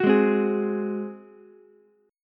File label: F sharp Suspended 2nd Flat 5th, Ukulele; strummed; JGuitar